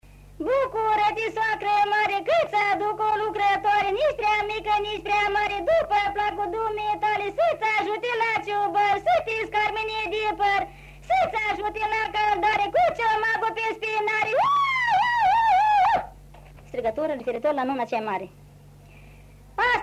Strigaturi la nuni; Vaslui

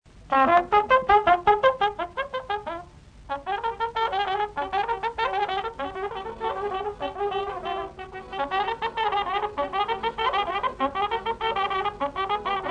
Rusasca la trompeta; jocuri si cantece batranesti; Vaslui

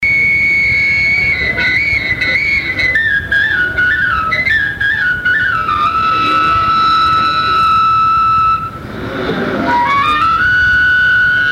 Doina Sarba, popular vasluian; Centrul Judetean pentru Conservarea si Promovarea Culturii Traditionale Vaslui

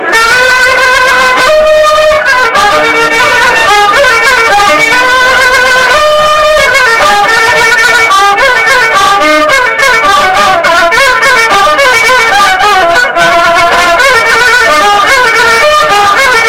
Sarba bulgareasca, joc popular vasluian; Centrul Judetean pentru Conservarea si Promovarea Culturii Traditionale Vaslui

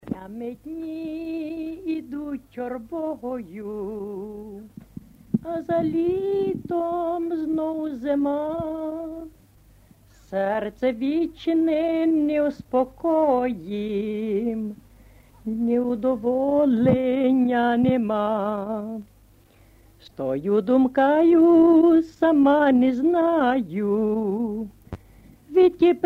Zilele trec gramada in ucraineana; jocuri si cantece batranesti; Vaslui

